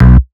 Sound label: bass staccato; osu